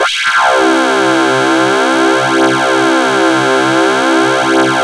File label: Oscar bass